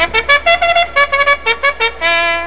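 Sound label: horse race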